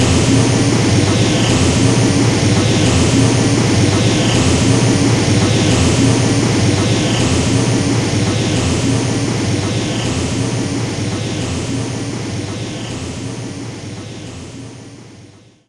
Enemy Roadmechanic Active Loop; Disney Star Smash; Android, iOS, Mobile

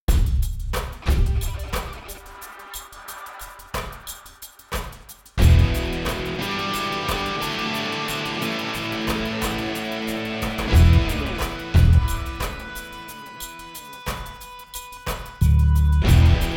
Relaxed And Strong; monster energy supercross the official videogame 2

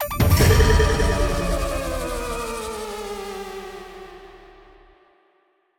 stinger Coop Downed

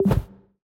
Disappear

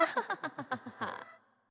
Teleport; Winkubus Teleport 1 New

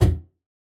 Wall

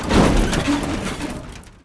Land Hard; Test Drive Off-Road 3

